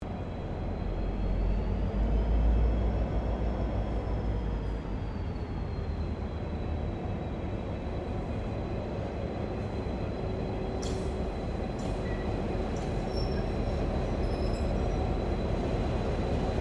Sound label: Ambience Railway Station Freight Diesel Shunting; General Ambience at Southern Cross railway station; Train Station Sound Effects; Foundation1